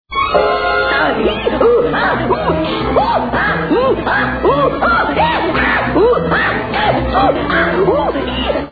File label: Daffy screams (multiple)